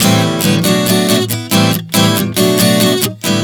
Am-Bm-C-Bm 140 bpm, acoustic guitar chord progressions